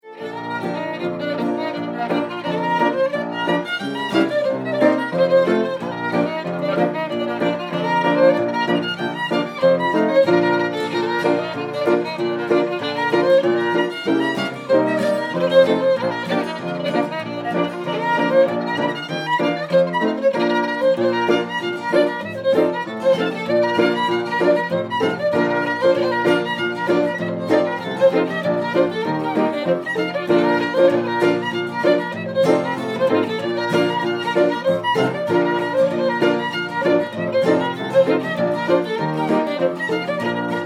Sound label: Fiddle; Five Dollar Fiddle